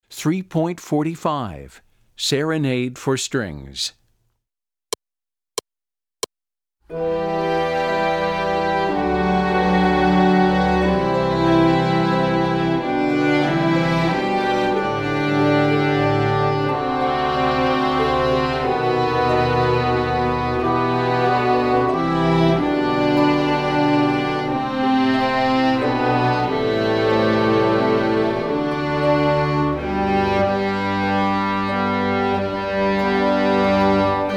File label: Serenade for Strings